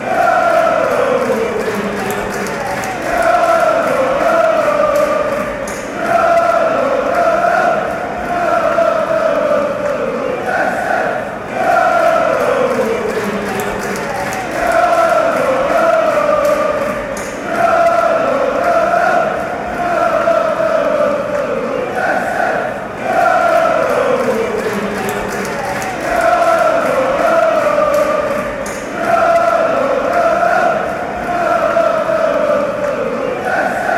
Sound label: lolololo, chants